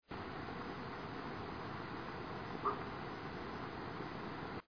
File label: bedsprings